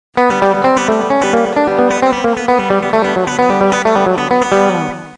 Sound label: country chop